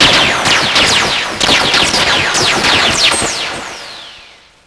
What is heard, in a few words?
laser